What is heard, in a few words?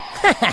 chuckle; sonic